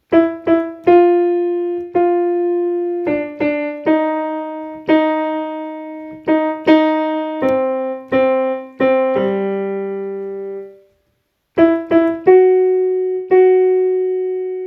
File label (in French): c'est Noel rock alti piano